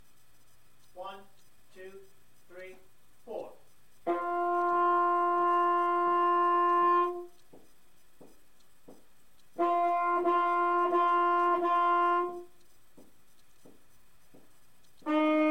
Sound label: sax tongues